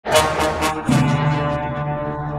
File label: suspense theme fast